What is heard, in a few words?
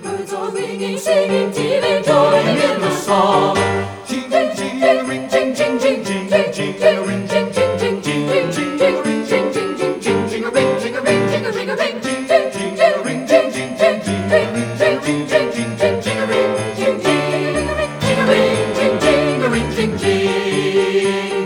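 soprano alto tenor bass Full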